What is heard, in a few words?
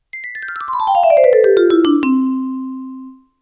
bright bell; anime